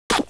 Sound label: body, fleshhit